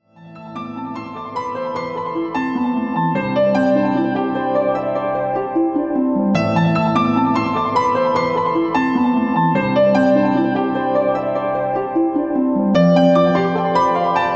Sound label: jingle